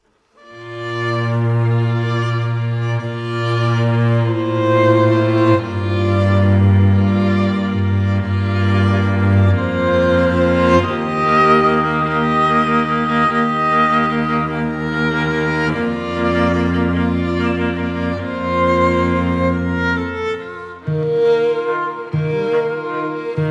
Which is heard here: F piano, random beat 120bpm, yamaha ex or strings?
strings